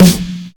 puffy acoustic snare f key, Royality free snare drum sample tuned to the F note